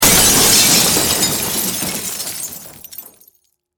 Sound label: Impact Crash Wood Glass